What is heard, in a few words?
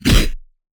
Anime Super Whoosh Punch